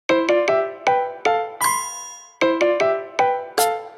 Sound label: line ringtone spring